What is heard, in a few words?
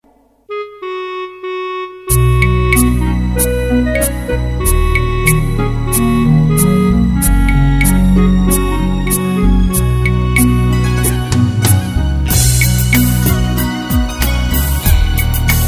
beat